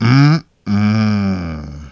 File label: MMMMMM